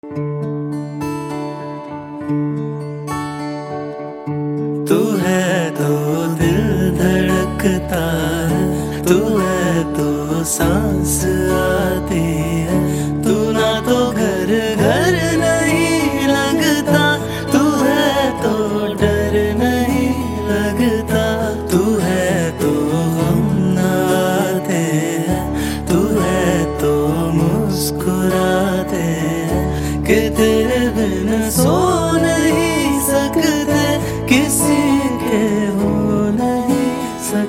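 bollywood songs cover song love song cover music acoustic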